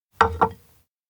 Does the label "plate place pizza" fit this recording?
Yes